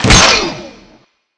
chainsaw slash; weapons

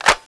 destroyer clip out, weapons

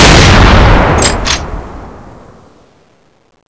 chain sr, weapons

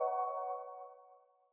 wing gun loop end

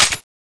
fiveseven clip out, weapons

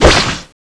magic knife slash

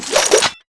whip sword slash end

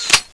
scan deactivate; weapons

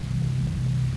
wonder cannon idle

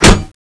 lance wall metal, weapons

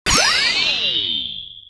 connect

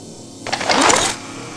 chainsaw draw, weapons

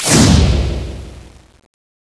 magic knife magic end

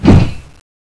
draw attack, weapons

